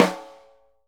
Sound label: SNR, Synclavier Percussion 2 - World & Orchestral